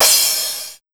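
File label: TECH 140 crash, Big Beat Drumloops; AKAI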